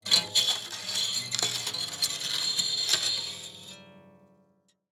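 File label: Zither Metal Brush Scrapes, contact; GDC Game Audio Bundle; Twisted Zither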